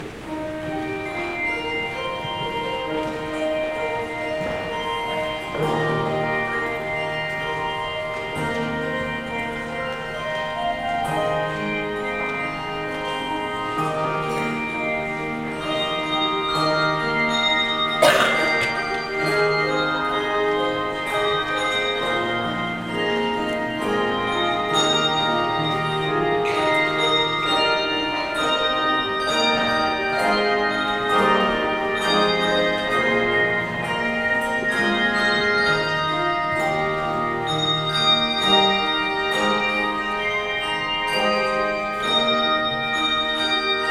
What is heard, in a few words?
Handbells